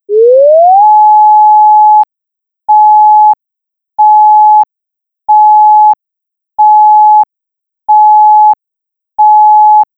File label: Pulsed Steady, outdoor warning; emergency; FWS-4025